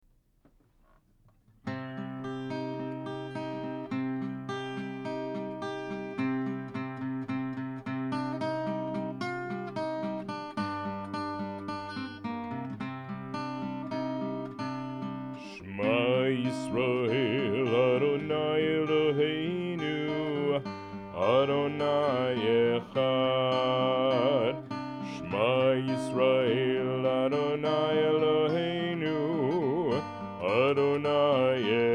Shma musical version

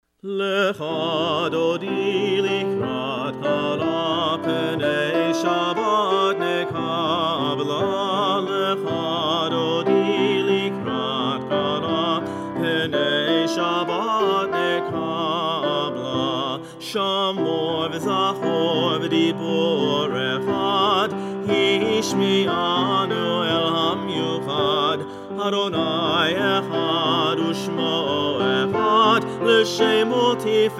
Lcha Dodi, Cantor

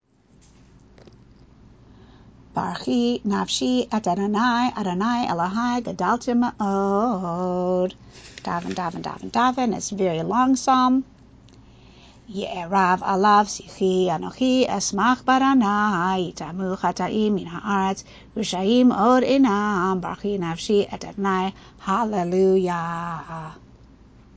weekday psalm for rosh hodesh; weekday shacharit

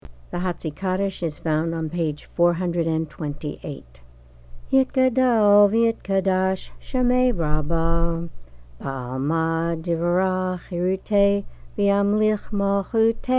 chatzi kaddish; Shabbat Service

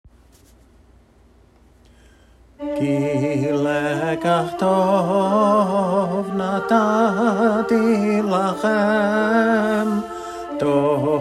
Etz chayim harmony

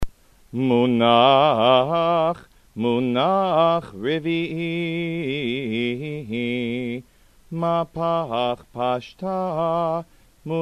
Haftarah Trop

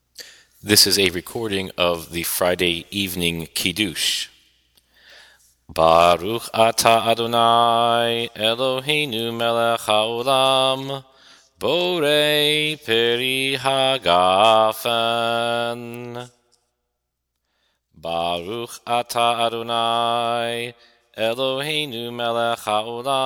Kiddush Friday night; Prayers Online

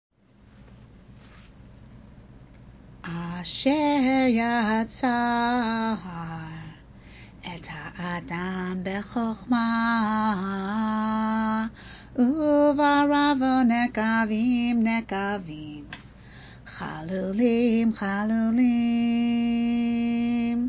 asher yatzar melody

